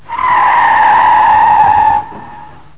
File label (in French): freins